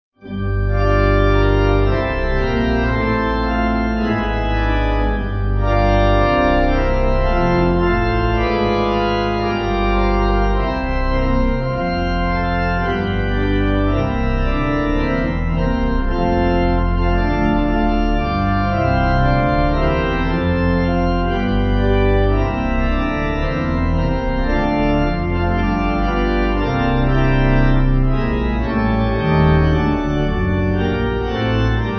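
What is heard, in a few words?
Pipe, hymn backing; Now Thank We; Gracias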